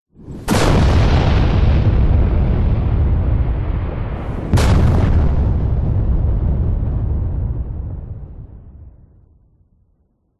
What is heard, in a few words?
double large explosion some very light distortion